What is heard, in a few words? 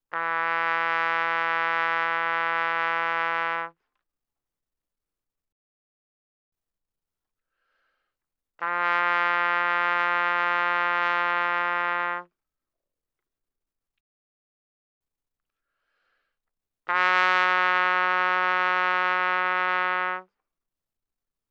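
Trumpet no vibrato mf E3 B3; Brass; Bbtrumpet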